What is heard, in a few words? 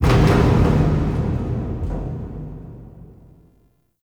Zariman Metal Rumbles; Warframe; The New War